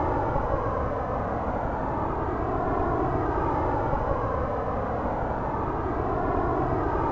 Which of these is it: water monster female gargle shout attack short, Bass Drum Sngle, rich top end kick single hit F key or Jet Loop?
Jet Loop